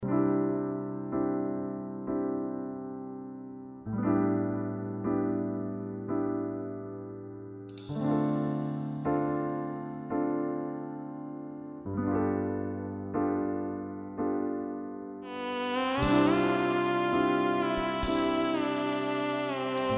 distortion on solo

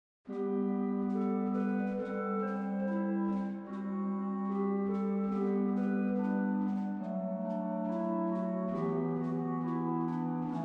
Piano Grade 1 Finger Exercises Ideas

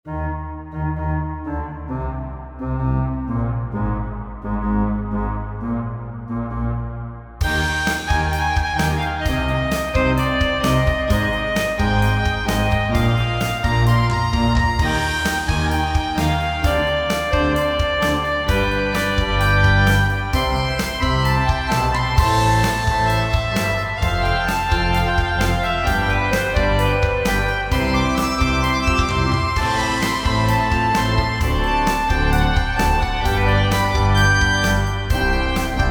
Organteq with drums

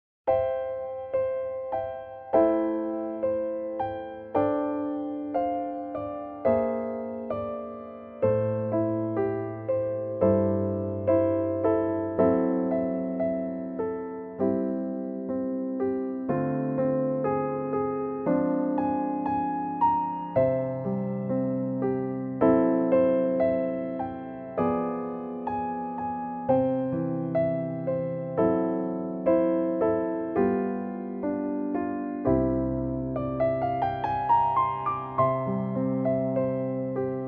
Bechstein; Starry sky

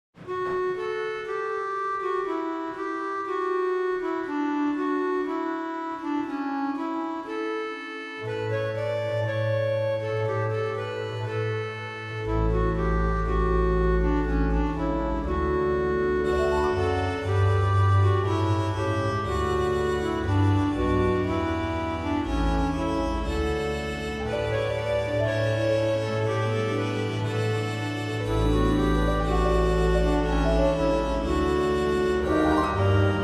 organ reeds

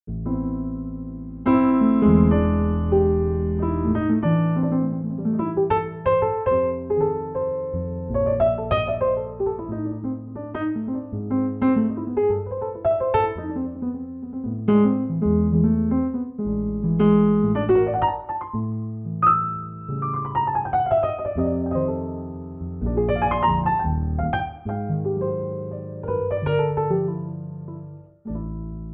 Jazzy Bosendorfer 290

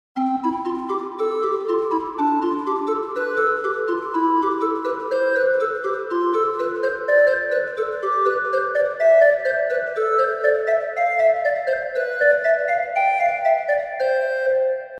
dynamic organ Gedact HANON

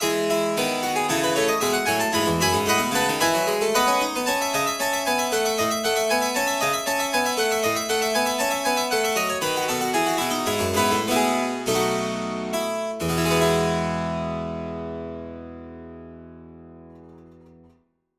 Open Lid Live Wide Stereo Loud Ruckers

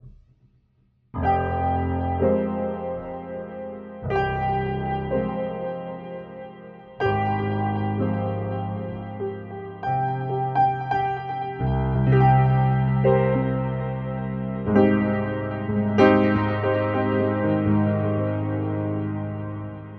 Piano loop tape; Waves Abbey Road J37

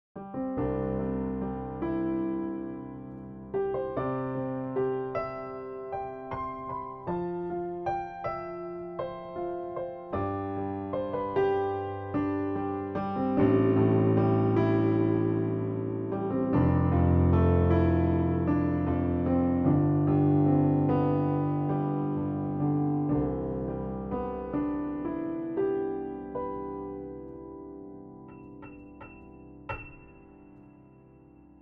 Upright Piano

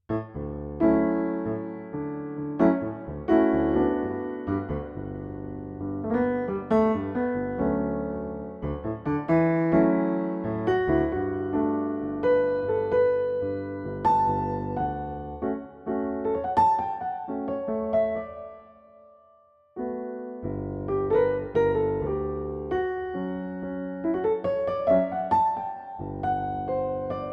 Nice Piano Miked

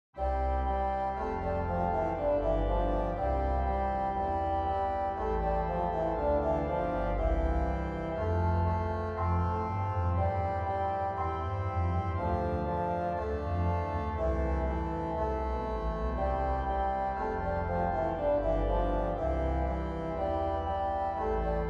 organ reeds

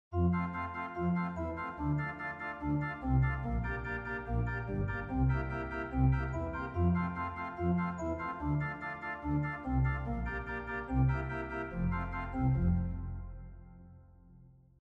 romantic organ

